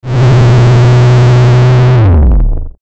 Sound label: Electrical Low Hum; Electrical Low Hum Buzz Power Down